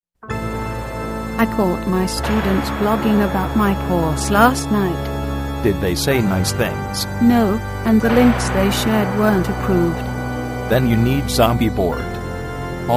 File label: zombie